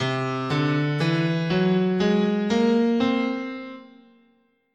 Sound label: C Whole Tone, scale